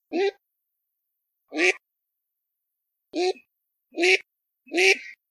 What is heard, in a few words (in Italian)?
alzavola